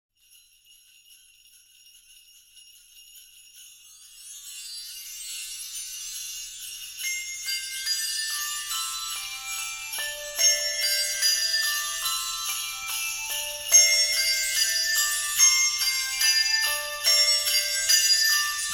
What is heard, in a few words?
Christmas Handbells, Jingle Bells; Handbell Ringers; Handbell Ringers Of Great Britain